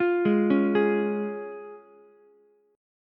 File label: C sharp Major, Ukulele; arpeggiated; JGuitar